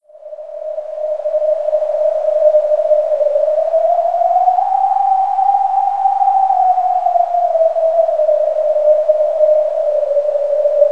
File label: Ambient Wind; Umineko